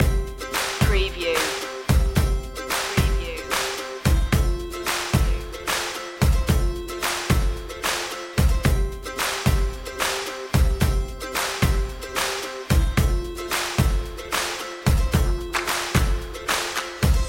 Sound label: happy to help loop